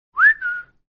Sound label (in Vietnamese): Nhạc chuông huýt sáo; shopee